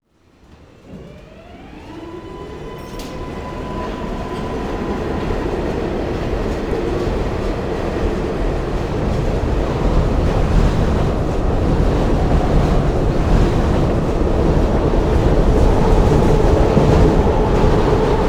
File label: subway move, subway train; ucsounds